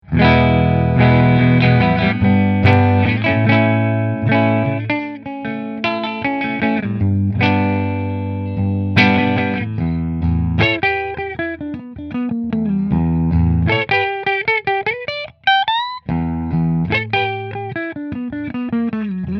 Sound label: Robin Avalon Middle Fender